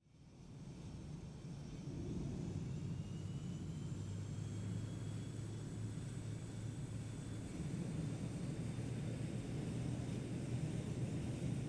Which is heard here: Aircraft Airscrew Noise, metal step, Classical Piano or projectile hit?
Aircraft Airscrew Noise